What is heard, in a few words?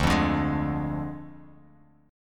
D Diminished D, Guitar, Open F; strummed